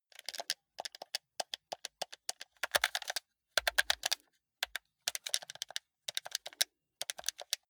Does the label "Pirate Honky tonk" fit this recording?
No